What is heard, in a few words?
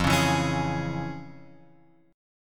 F Minor Major 7th Sharp 5th; FmM7#5 Chord {1 4 2 X 2 4} strummed